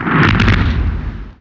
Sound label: Impact; falcon